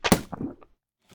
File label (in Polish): chwytanie toporkiem